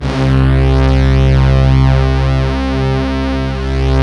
SYN, SYN Analog; Sound & Vision; Gigapack I CD 2

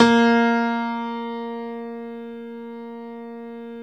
Stereo Grand, Keyboards

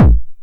909 BD Tape Sat

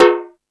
Rap Session, E-MU Pro-Cussion

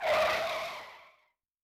Tire; Yamaha DTXpress-II

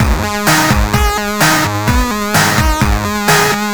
Full, 128bpm, Nu Dance Electro; Zero-G; Soundclash; Acidized Wavs; Combi Loops

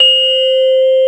GLOCK WV; CMP - Analogon; RESO

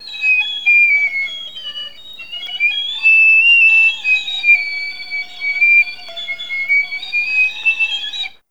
FL FX, Orchestra; Best Service; Peter Siedlaczek's Advanced Orchestra

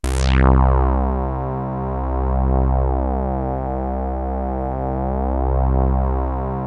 FAT SAWZ; MORPHEUS

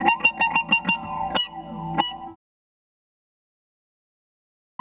electric guitar; Chillin Out; Sonic Foundry (Sony Creative Software); Stylus Pressure Urban Grooves on Digital Wax

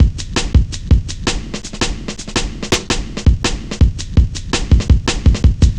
Cut Thru B, Drum Loops, Jungle, 165.5

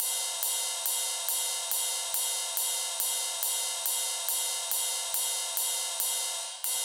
drum Loop 140BPM Ride; Multi Loops

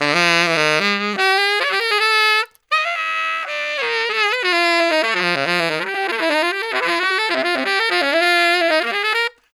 Tenor Free, Tenor Sax Loops; Sonic Foundry (Sony Creative Software); Jazz Solos and Sections

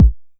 909 BD; Goldbaby Tape808 & Tape909; The Tape 909

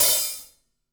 DW Red Maple Hi-Hat Open Stereo; 2002 DW Red Maple Kit; One-Shots; Sonic Foundry (Sony Creative Software); Vital Drums

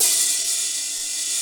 D70 HH, D70 DRUMS; Crypton - DrumBase5000

